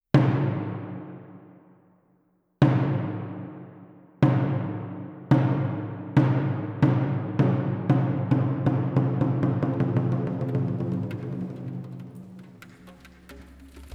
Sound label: DJembe Percussion Improvisation; Group; Madinka